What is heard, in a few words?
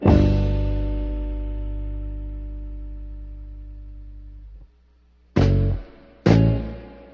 guitar, Jazzmatazz loops